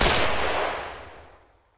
m4 fire distant loop tail; Escape from Tarkov

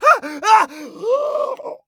agony; Escape from Tarkov; scav4

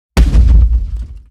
damage hits explosions; World Of Warships

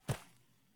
run grass low; Escape from Tarkov

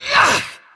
vce dfa jump

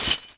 Chain Catch; Civ4 warlords

